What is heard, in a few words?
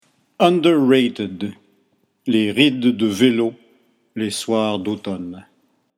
ride